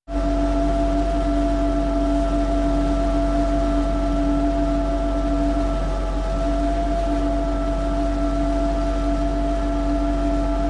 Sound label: Motor 2; Running Steady.